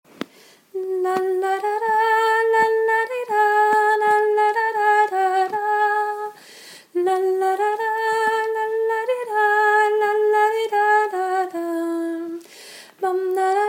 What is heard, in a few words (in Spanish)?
Marcha y baile